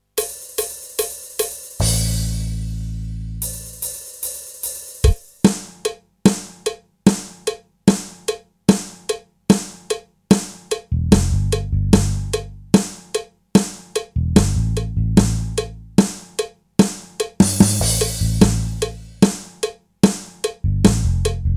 Backing

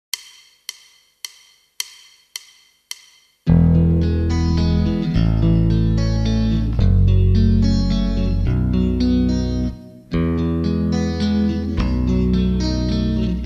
Waltz

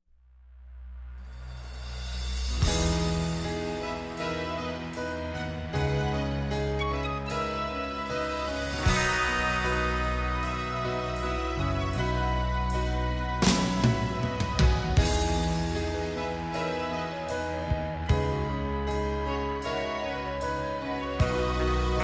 Backing Track

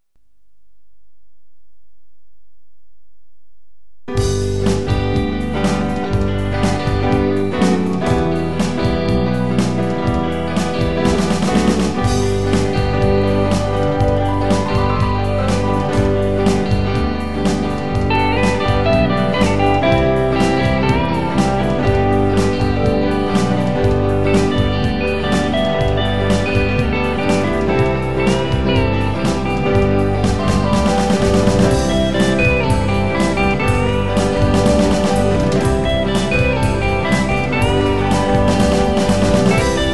Backing Tracks

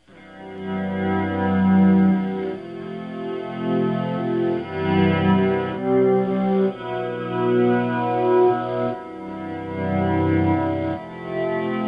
Solo Only

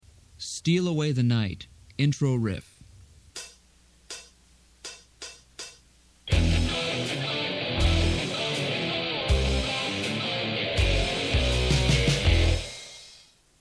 main riff

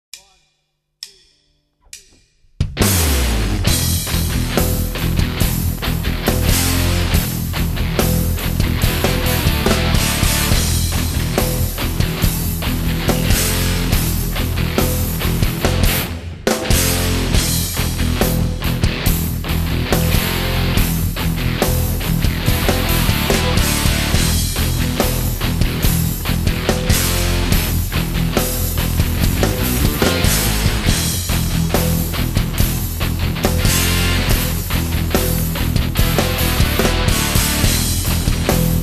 Backing Track

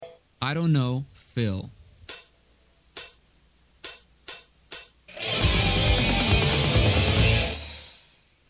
fill

